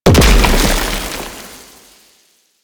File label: limb death dissolve; Warframe; Man In The Wall